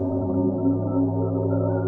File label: Suction